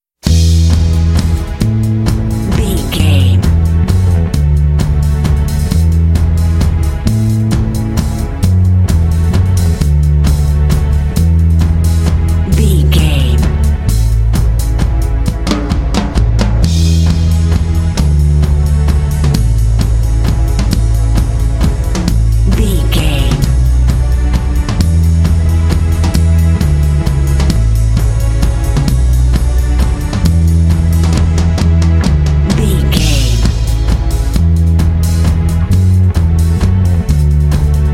rusty slide stomp twang bed

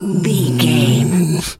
bear pain whimper

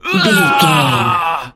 voice male death

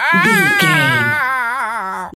voice male death

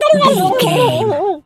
turkey ostrich hurt gobble

